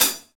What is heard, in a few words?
Pop Closed HiHat